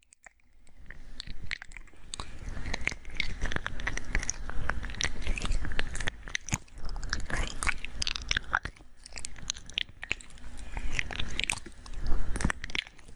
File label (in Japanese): ぴちゃぴちゃと美少女の唾液が脳みそを這いずり回る耳舐めボイス